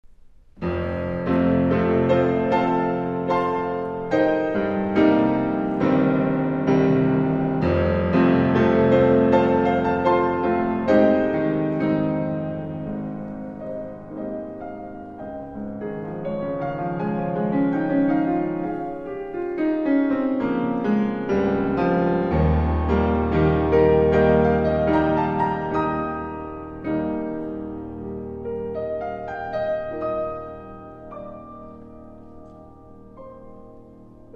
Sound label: interlude piano solo; TTBB; chorus male